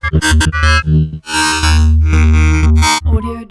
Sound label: synth sawtooth; pooper; soundmorph